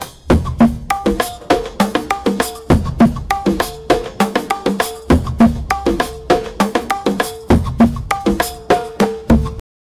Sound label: afro beat sound; african